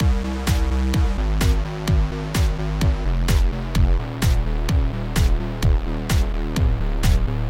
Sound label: sidechain no duck